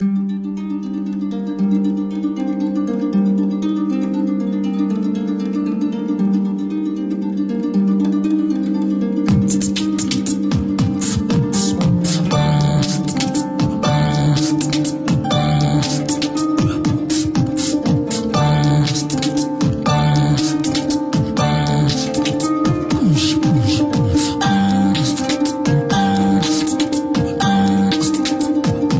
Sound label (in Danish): harpe; Danske Bank